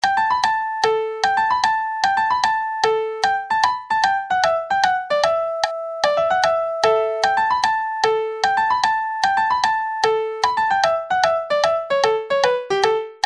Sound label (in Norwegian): Udelt takt, Vl1